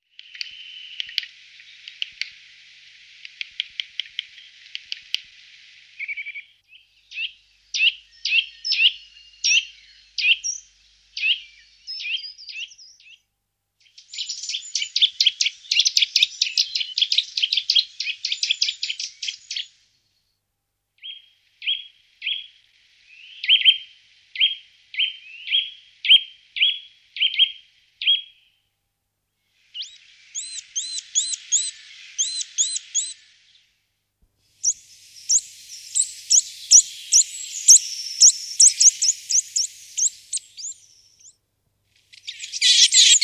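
obyknovennyy popolzen golos, Eurasian nuthatch voice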